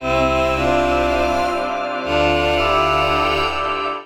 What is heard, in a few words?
Galaxy Voices C Major 118 bpm, Choral; Voice Box; Chromatic